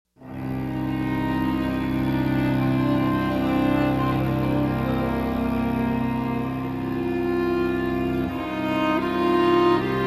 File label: Arr. For String Quartet; Michael Gordon